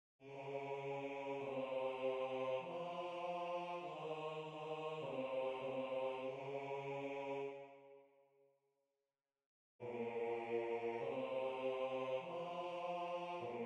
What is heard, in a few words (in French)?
Basse; Gevaert